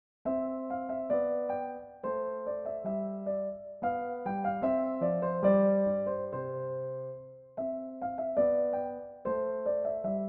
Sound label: gavotte, Allegretto